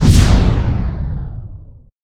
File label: ugv lasercannon fire; ufoai; weapons